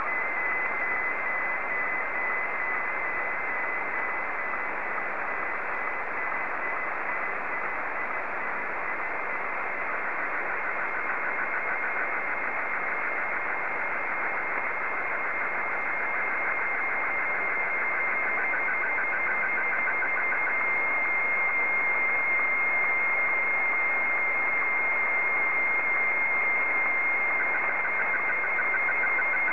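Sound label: Martin 1, slow-scan television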